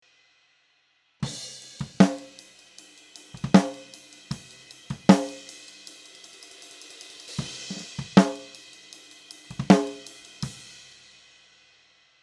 Section B Snare

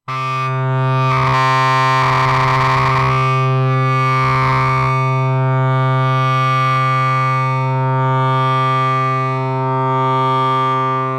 slightly detuned oscs

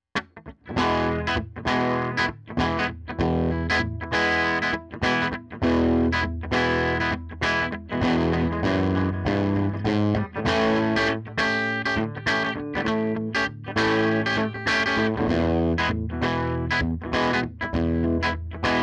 bassman

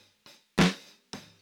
snare

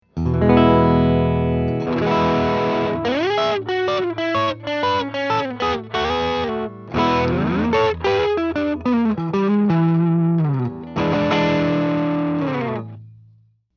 MID GAIN TS9

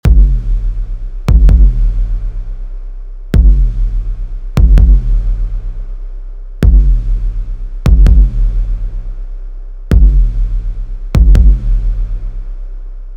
SUB BASS AND KICK WITH COMPRESSION

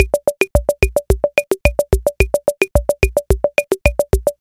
Rumba

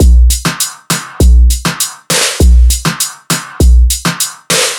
Hi Hats and Snare Rolls

